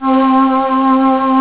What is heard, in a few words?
choir pad; gmplay